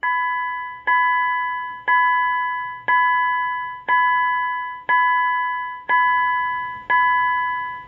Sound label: Tone